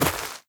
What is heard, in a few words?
step light, footsteps player grass